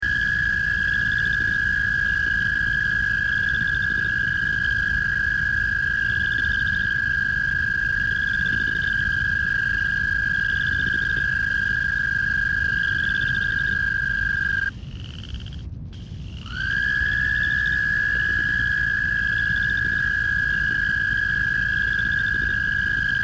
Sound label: American Toad; wildlife